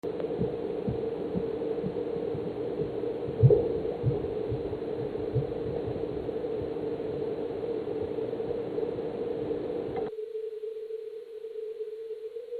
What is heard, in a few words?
St George terrace Gphone tuning into the leak